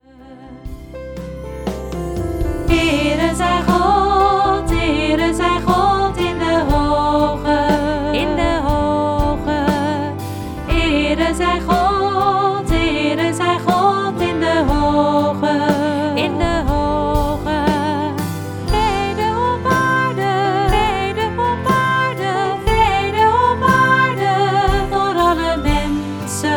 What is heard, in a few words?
Ere Zij God Vocal